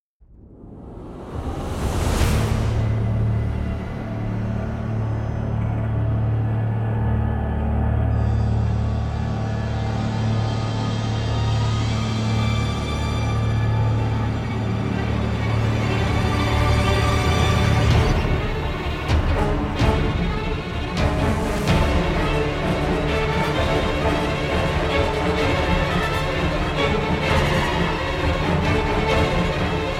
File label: Thriller